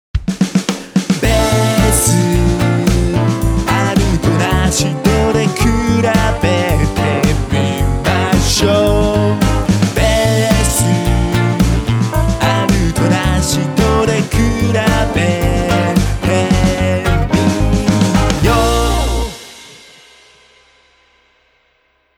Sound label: bass